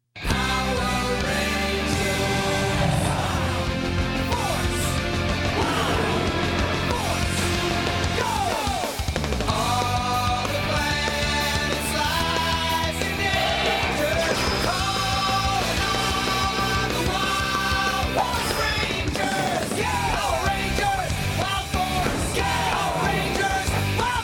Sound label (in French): musique generique